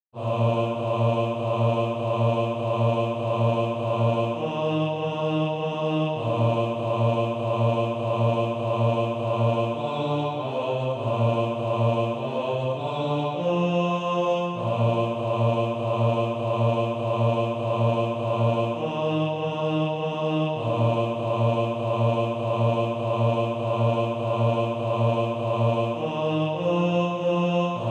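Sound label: bass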